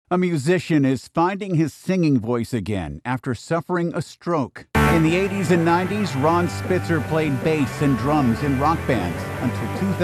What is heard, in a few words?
choir intro choir